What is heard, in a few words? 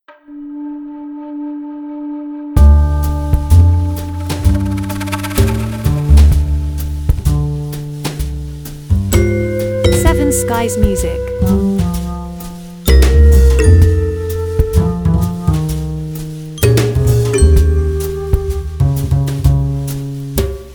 Light Comedy